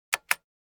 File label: computer keyboard; NCH Library